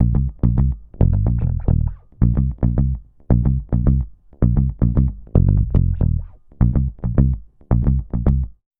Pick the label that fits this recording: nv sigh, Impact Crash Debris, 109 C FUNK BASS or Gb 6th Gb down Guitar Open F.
109 C FUNK BASS